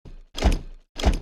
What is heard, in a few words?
Walk; starbites